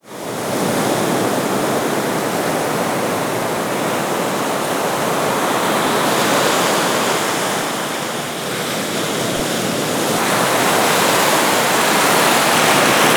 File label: SEA; USB Soundscan vol.45 - Indian Traditions; AKAI; AMBIENCE2